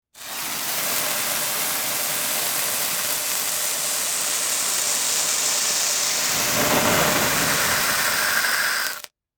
Fireworks firecrackers fountain sizzle and crackle